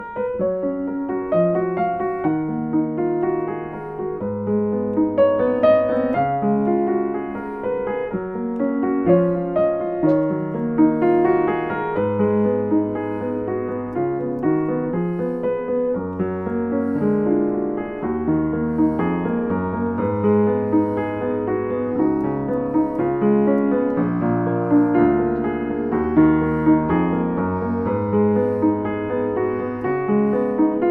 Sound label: Yamaha U1 H, piano